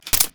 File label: p90 mag out; weapons